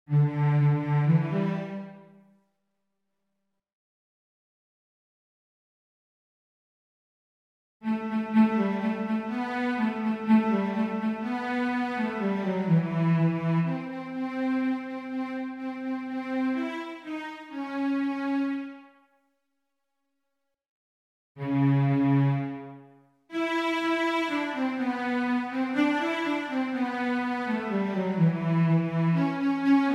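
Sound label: Bartok For Children Canon Cello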